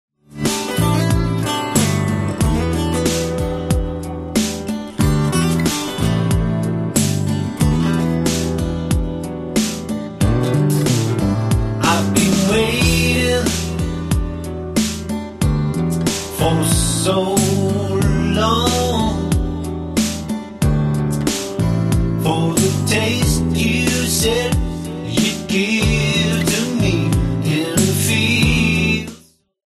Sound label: acoustic